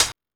Techno D; EMU XL-7

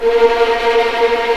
A#3 TREM, VLNS TREMOLO, String; East West; The Ultimate String Collection

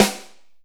SN, Ensoniq ZR-76; 300 Drum Machines

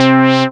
SYN SH, Roland; Sound & Vision - Gigapack I CD 2

